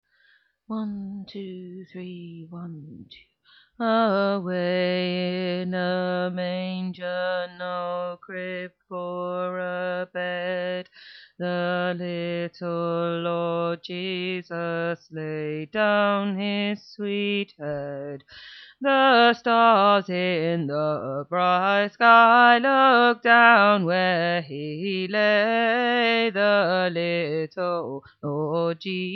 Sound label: Bass